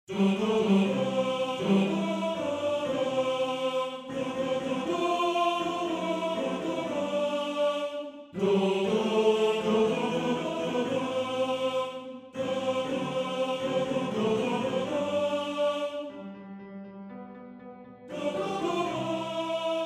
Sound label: Tenor; Tenor 1